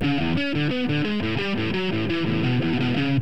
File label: guitar, heavy distortion guitar